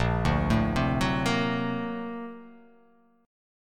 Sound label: A sharp Augmented Major 9th, chord; arpeggiated; JGuitar